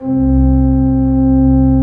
C1, Cathedral Organ; ILIO; Propeller Islands Cathedral Organ

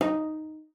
D#4, CELLO FINGER; Xsample VOL-5

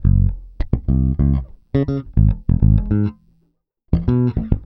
Pop, Bass; Sonic Foundry (Sony Creative Software); Bass X

